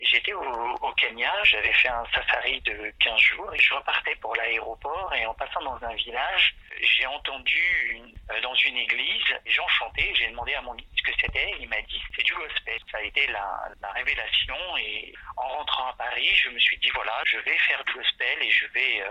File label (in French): gospel pour 100 voix